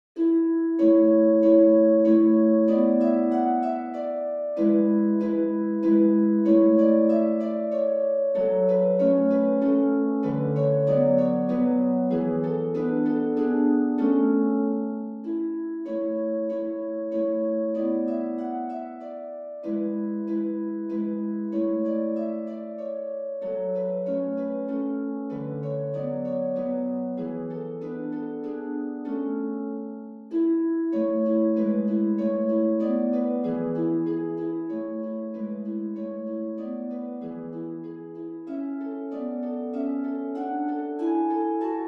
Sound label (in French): contredanse majeur; beethoven ludwig van